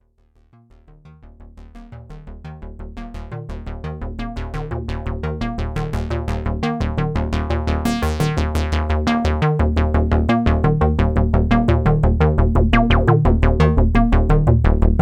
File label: DominionX Simple Sequ Pulse